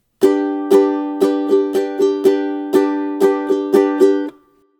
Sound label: d d dudu, ukulele